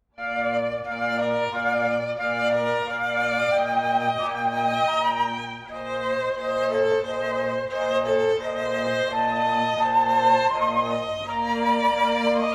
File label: Fl Trio; Bach Sheep May Safely Graze; Cantilena South Florida